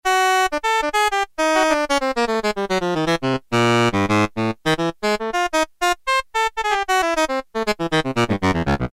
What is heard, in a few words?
Mello Tenor, Yamaha TX81Z